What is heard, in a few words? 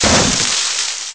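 crash bash; RedLine demo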